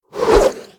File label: Lasso Underlay Attack